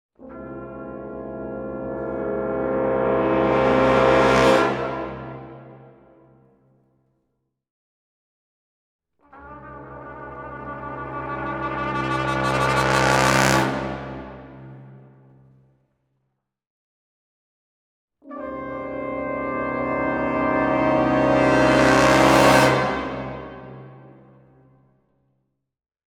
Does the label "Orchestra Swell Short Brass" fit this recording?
Yes